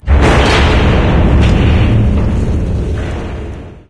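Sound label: silo door start close; video games; ambient; source engine; portal2; outland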